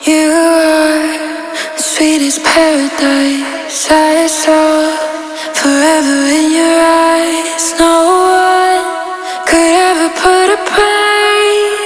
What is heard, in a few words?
FUNK Superbass